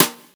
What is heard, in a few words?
raw snare a sharp key, snare drum sample tuned to the A# note. Loudest frequency: 6212Hz; snare drum samples